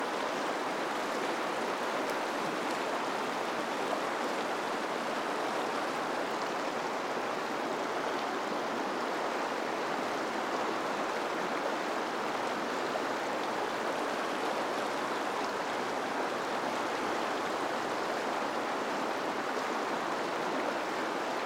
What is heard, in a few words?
Rushing Water No Traffic